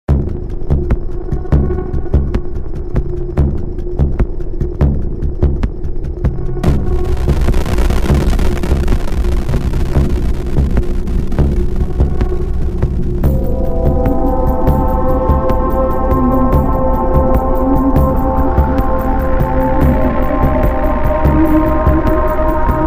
ambience music

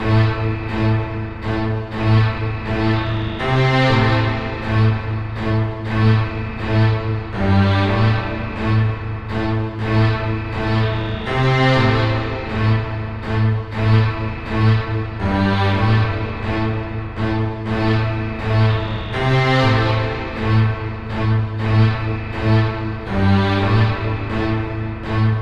loop uplifting

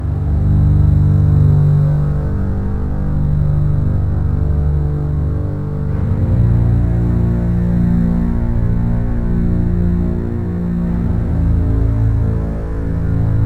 danger drone

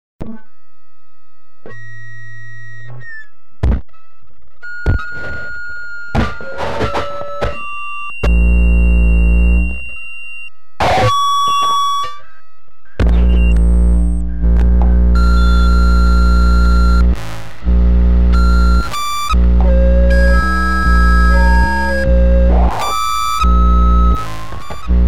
feedback test

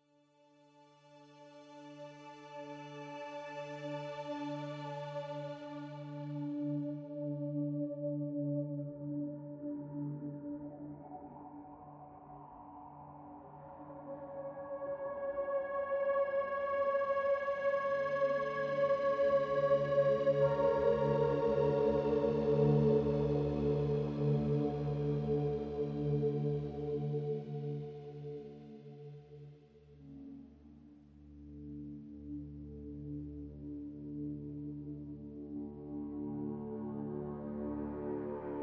ambient

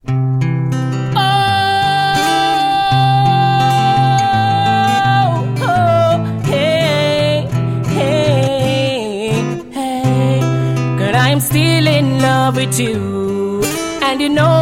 acoustic version

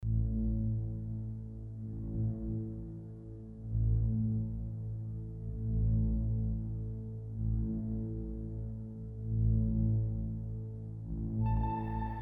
indian rain tabla ibiza chillout mix

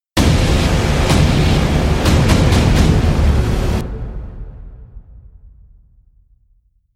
tonal impacts jaeger bomb with slam

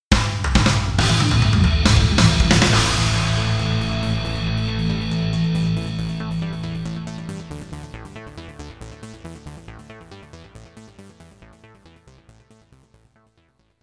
arcade game over

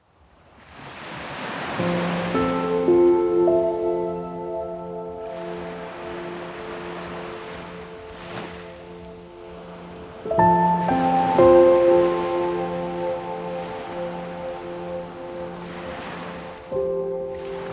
piano ocean noises

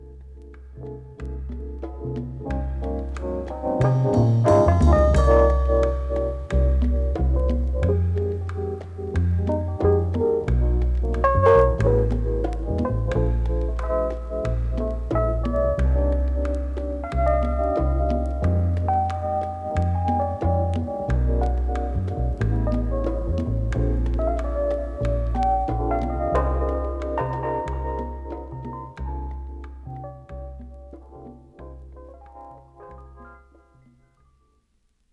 electric piano solo

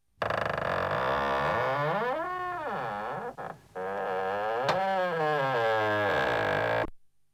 door open; bullet uk

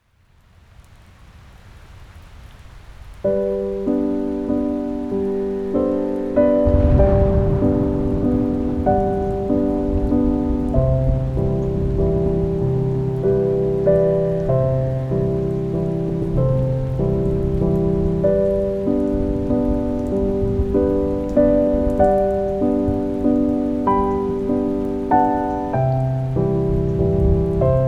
thunderstorm piano

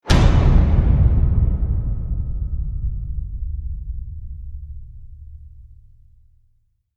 metallic slams gut punch

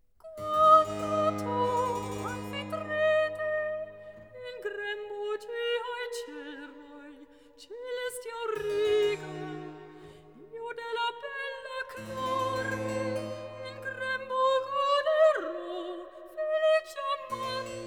cantata for soprano solo recitativo

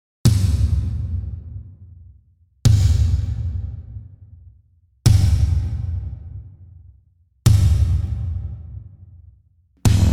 drums

